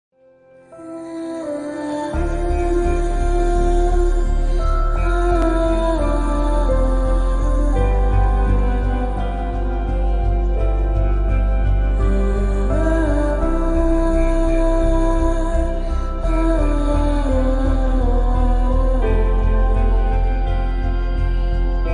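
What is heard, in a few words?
emotional background music; bajrangi bhaijaan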